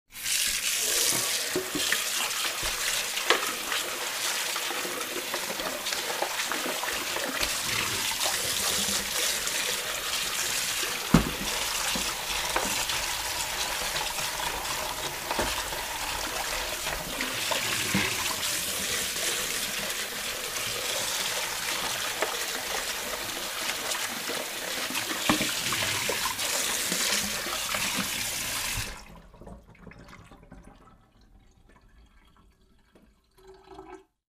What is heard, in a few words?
rinsing dishes, domestic